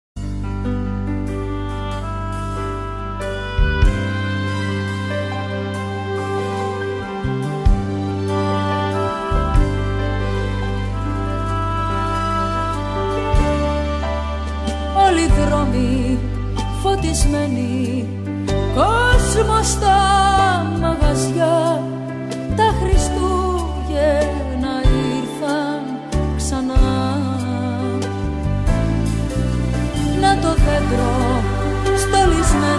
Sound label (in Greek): Των Χριστουγέννων καμπάνες, Christmas bells